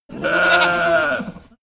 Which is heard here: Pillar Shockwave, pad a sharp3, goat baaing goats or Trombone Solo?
goat baaing goats